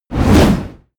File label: whoosh throw